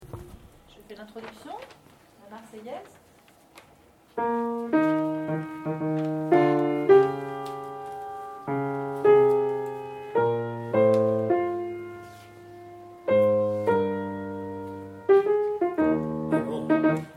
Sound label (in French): 1ere voix